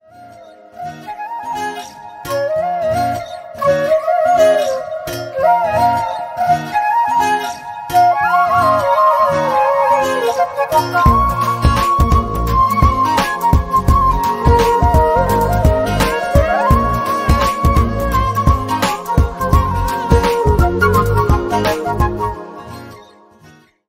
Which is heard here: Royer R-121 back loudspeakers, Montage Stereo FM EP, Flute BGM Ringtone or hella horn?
Flute BGM Ringtone